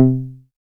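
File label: pluck C3, 5U Modular; Polyend; Simulacrum Sounds; 1 Shots; Synths; Leads and Keys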